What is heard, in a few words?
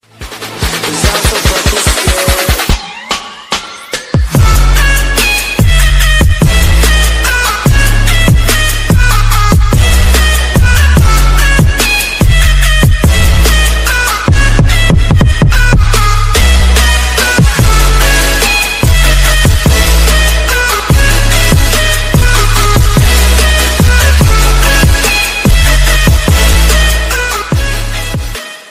english ringtone